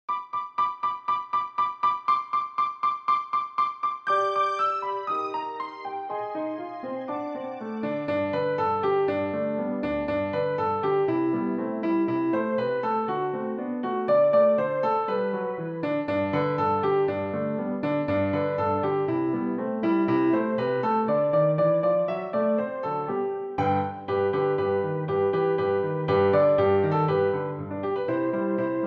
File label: jinglebell; ジングル・ベル; Merry Christmas